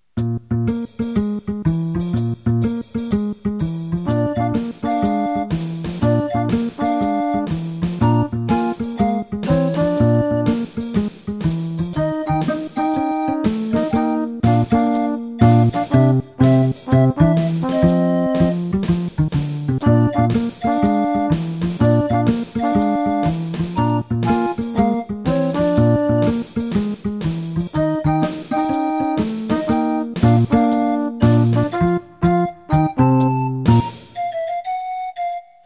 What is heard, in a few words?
Jazz 122